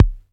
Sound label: Bassdrums